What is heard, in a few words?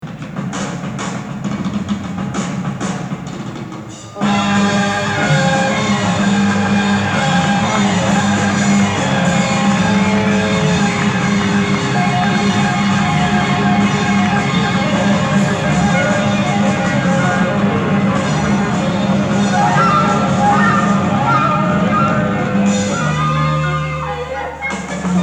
drums; trap masters